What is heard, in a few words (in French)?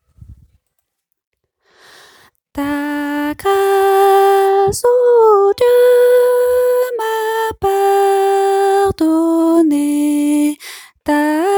soprano; chant 166